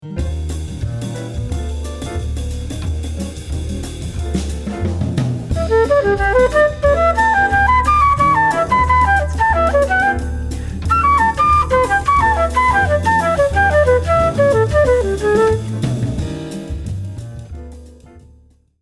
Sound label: a7 triad